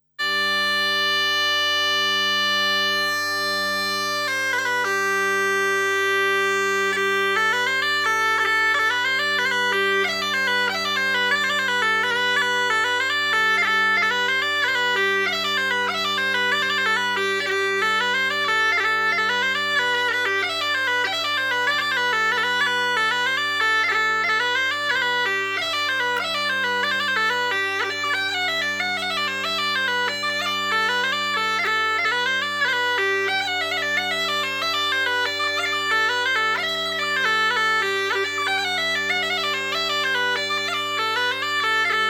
Scottisch; Scottisch de Cordes

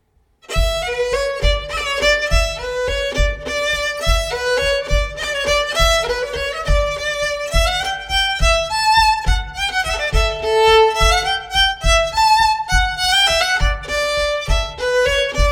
Montagnarde, 1er air; Recueil Hainl; Montagnarde d Aurillac

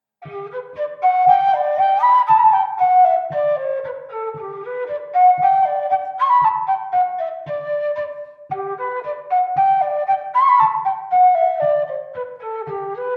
Bourree; Recueil De Raoulx

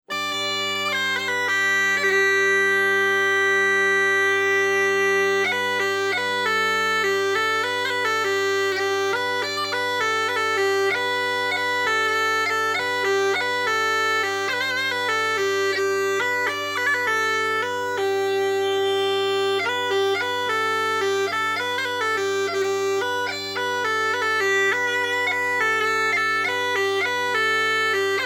Valse; Valse a Nicaud

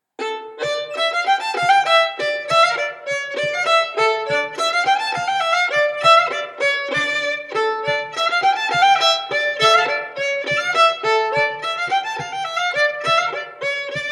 Montagnarde; Recueil Hainl; Montagnarde de Saint Flour

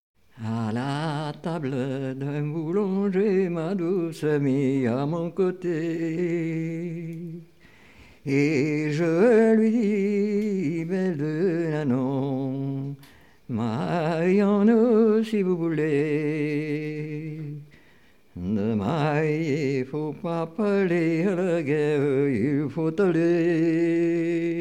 Chanson; A La Table D'un Boulanger

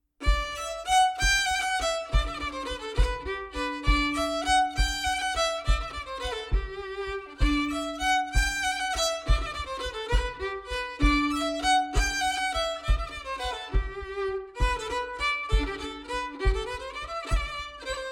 Bourree d'Auvergne; Bourrees et autres danses antiques publiees par Elie Dupeyrat

